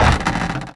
plank land

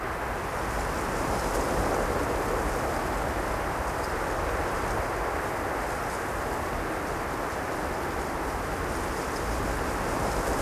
ambient port; World Of Warships; port3d

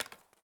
plastic card drop; Escape from Tarkov

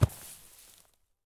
landing grass low; Escape from Tarkov

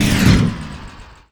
emerge no roar

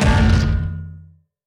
damage hits explosions, Warships

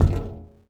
glass walk

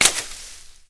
impact foliage; Modern Warfare

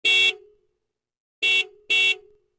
Horn; Civ4 warlords

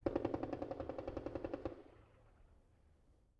ambient shot mono

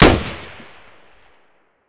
mosin outdoor close; Escape from Tarkov

